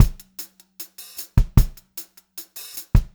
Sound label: POP VA, Pop-Rock Drumloops; USB Soundscan vol.37; AKAI; 90 s Sample CDs; 152 POP